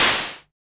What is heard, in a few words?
Simmons Clap; Drums